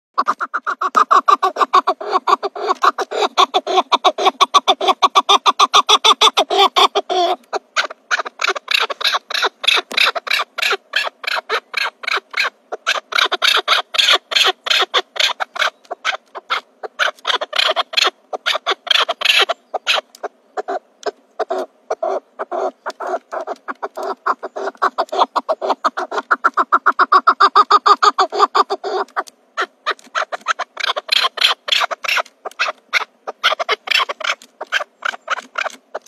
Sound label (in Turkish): keklik sesi